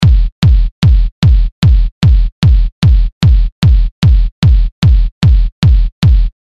Hardball Kick; Industrial

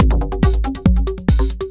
Tom Pattern, drums

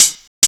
HH LOOP; USB Soundscan vol.27

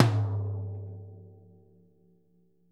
ROTOTOMS; Sonic Reality - Vintage Timetraveler

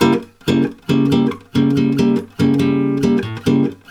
C# DIM GTR, Brazil Bossa; USB Soundscan; AKAI

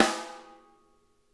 SNARE EDG; Sonic Reality; Interactive Drum Kits

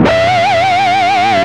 Squeel, Guitars

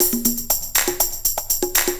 120 PERC

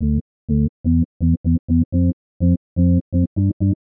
Organ Bass (125BPM), retro house

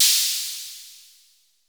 HAT O, HI-TECH KITS; Crypton - DrumBase5000

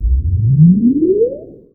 WOOOP; E-MU Formula 4000 Series Vol. 2

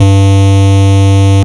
more bass G2; LIMAT LSDJ kit; LSDJ Extra Bass Notes

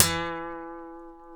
F#, funk guitars

